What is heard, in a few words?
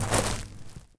Gravel step